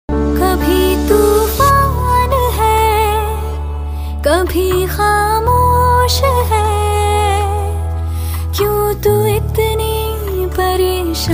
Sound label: sad female version song